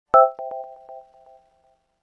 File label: Fold close; Nokia; 7390